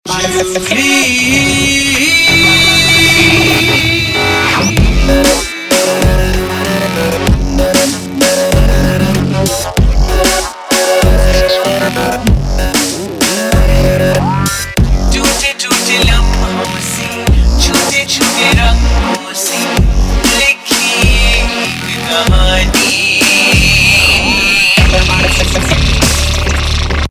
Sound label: Remix Ringtone, Bollywood